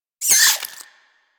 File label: vocal pain, critters; Warframe; Hunting Critters; Nexifera